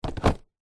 household mower electric grass catching basket attach hook on; zapsplat